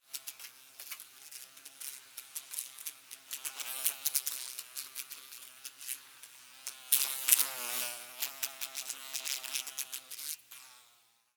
Fly, Light, Bulb, Buzz, Around, Wings, Insect, Bug; Flies; Animals:Insects